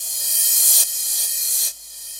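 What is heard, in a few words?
D2 crash, crash reverse; Best Service Dance Mega Drums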